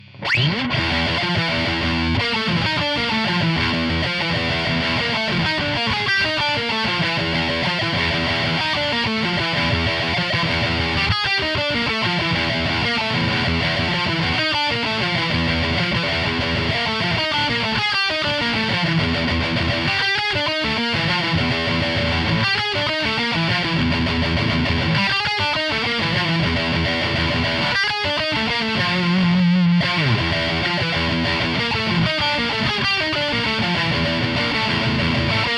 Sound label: riff